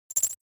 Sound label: ui scifi noise tiny splutter